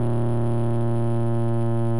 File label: car; CrossyRoad